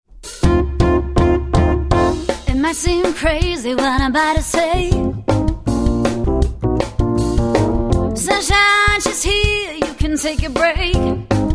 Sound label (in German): Quintett